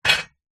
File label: household stack of plates dishes set down on wood surface